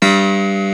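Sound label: PIANO CLEAR G1; AKAI S6000 CD-ROM - Volume 1; PIANOS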